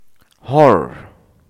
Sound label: horror